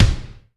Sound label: kicks